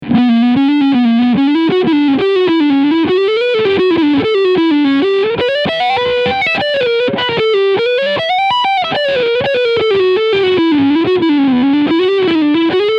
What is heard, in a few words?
PRS CE 24 Lead Sound Neck Pickup; Dustie Waring